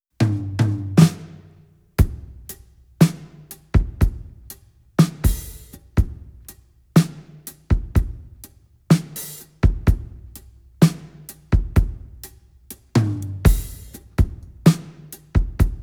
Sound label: Phase test 1x allpass